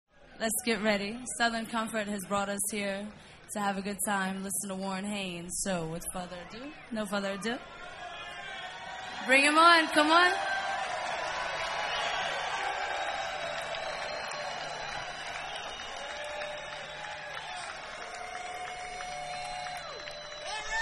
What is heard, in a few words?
jazz